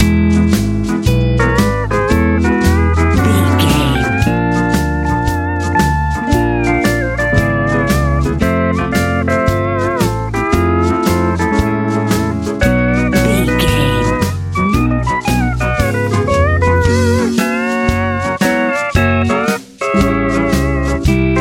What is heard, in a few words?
hopeful hot country